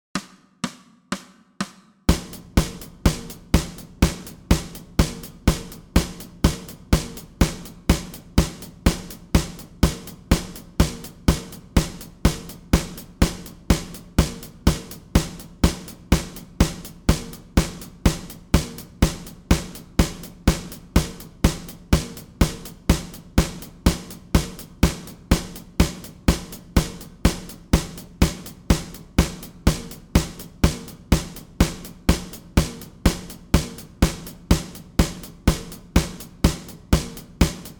124bpm, bass

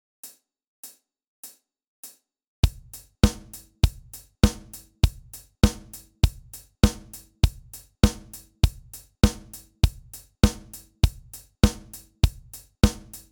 100 bpm, bass

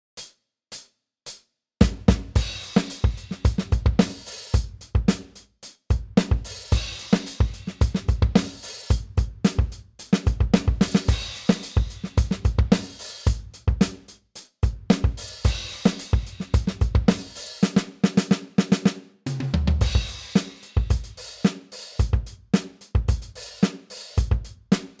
Chorus 110bpm

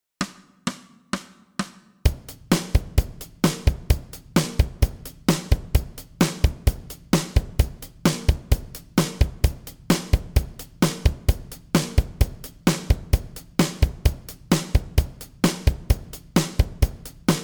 130bpm, bass

